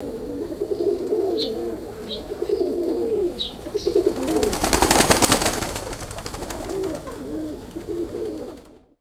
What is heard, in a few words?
flock of pigeons cooing and flying away; wings